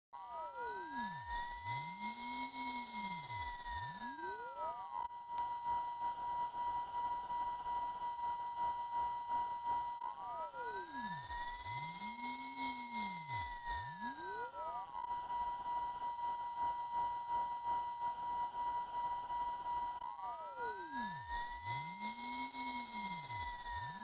single cycle

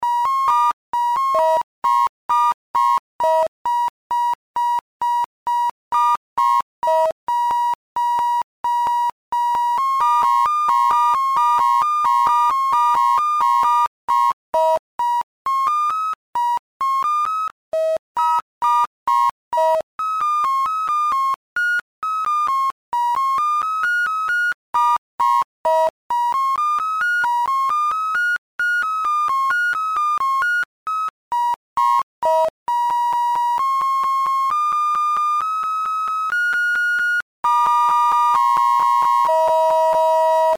Sine Wave Project

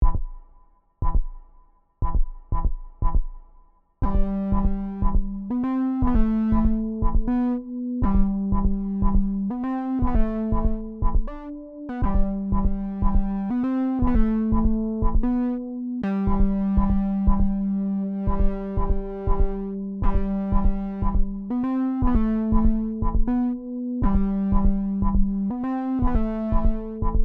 sine wave

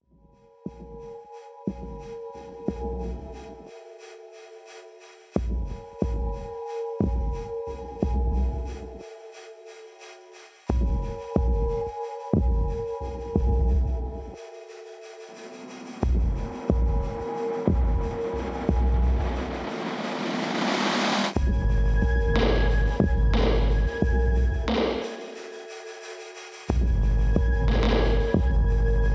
Sine Wave

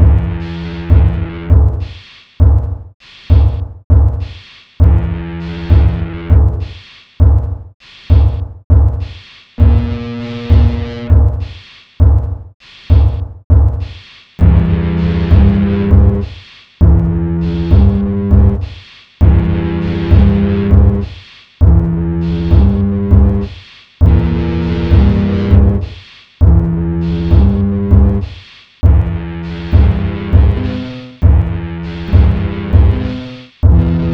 Sine Wave Project

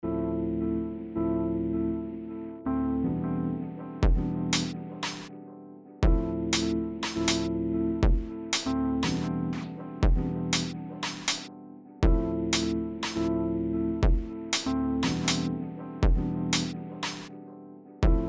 sine

